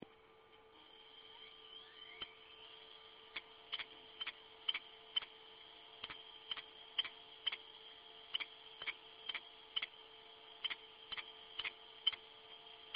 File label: hard disk drive mechanical head fault